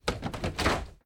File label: Locked Door